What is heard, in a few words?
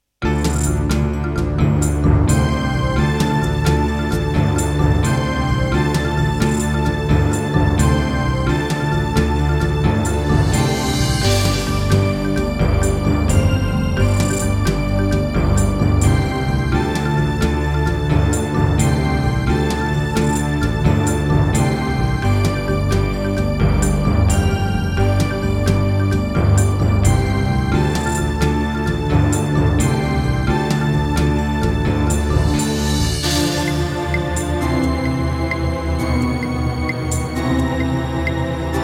Yamaha TG100, wavetable; Heretic